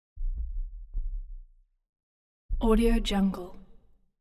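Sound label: metal smash low frequency effects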